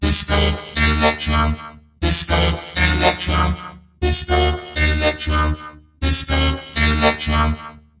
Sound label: 120 disco electro vocoded melody; VOCAL OR VOXY LOOPS